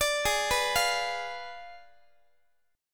Ab Diminished 7th Ab Guitar 4A,4E,5A,5D,5G sharp,6C sharp; arpeggiated; x,x,17,18,15,16; down